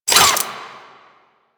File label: Necramech Repair Drone Idle Short; Warframe